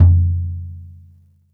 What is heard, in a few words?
bop kick - snares off; JazzFunkKit